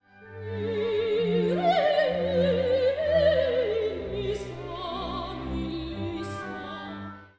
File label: Kyrie Soprano